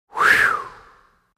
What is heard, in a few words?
WEATHER - WIND GUSTS: Individual gust of wind.